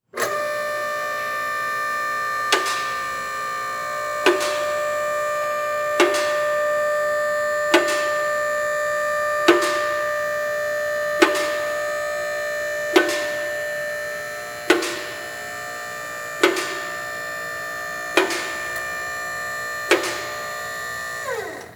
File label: Industry Hydraulic Pump Run